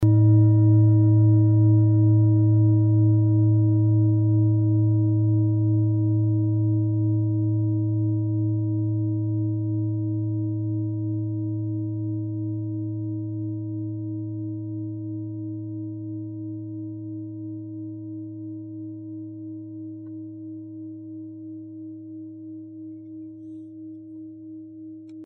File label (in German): klangschale nepal